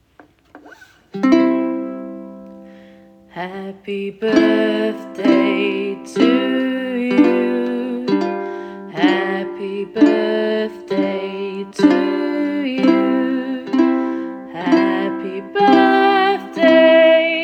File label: Uke happy birthday strum; Uke book